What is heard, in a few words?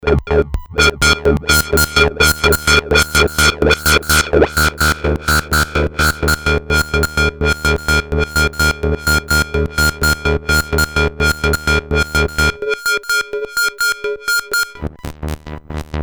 synth repeat; repeat Xmode; Polivoks formanta